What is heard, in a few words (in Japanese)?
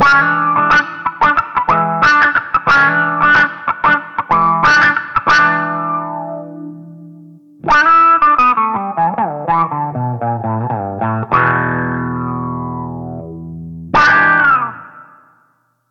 Clean SENS 13時